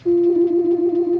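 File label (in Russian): высокий звук гула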